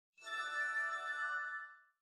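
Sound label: windows phone calling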